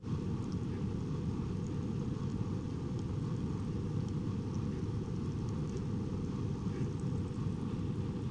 fermenting

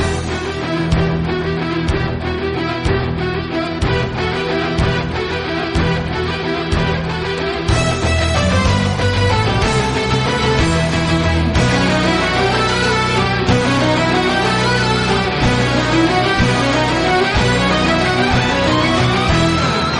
carol of the bells; xmas

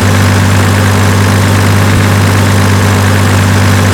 l6 diesel small, engines; acf engines

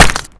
impact

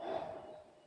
bong exhale short

